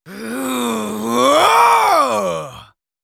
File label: Yells, Viking Vocal